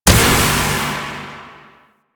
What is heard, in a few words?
Pillar Spawn Burst; Warframe; Warframes; Revenant